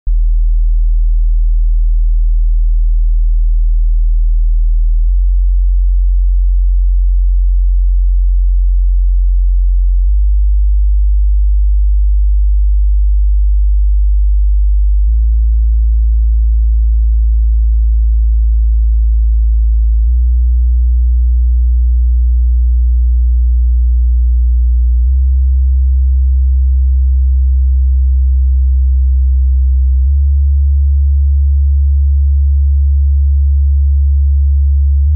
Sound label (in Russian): Ton peremennoj chastoty 40 95Gc 6db 5s5hz